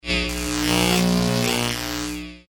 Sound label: Electric Buzz Swell, High voltage arcing buzzing swell; Electrical Sound Effects; Electricity sounds